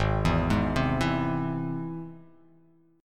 G sharp Augmented G sharp

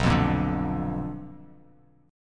B Minor Major 7th Flat 5th, Bass Standard; strummed; JGuitar

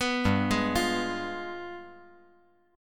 G 7th Flat 9th

